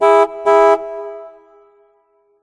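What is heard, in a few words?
ambience Tennis Crowd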